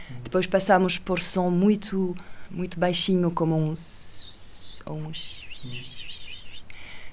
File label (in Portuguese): som shshshsh; A Bailarina Com Voz